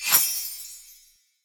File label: fairy fury summon; catha